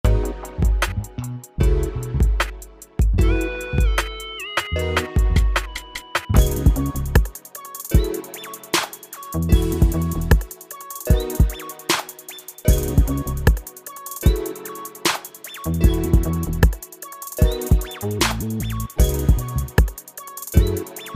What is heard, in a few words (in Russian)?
Минус Future Beat; Dmitrij Soundbox